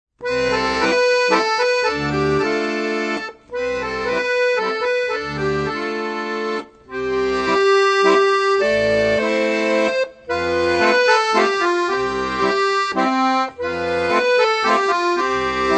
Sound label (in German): Akkordeon; Musikalische Vortragszeichen; stummer Fingerwechsel; Akkordeon Workshop Band 1